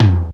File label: 505 TOM, TR-505; Hollywood Edge; The Big Bad Beatmachine